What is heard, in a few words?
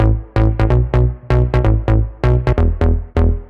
nineties techno, Loops